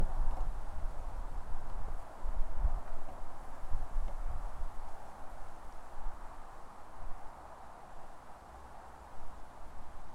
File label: Under Bridge; Bridge of Earn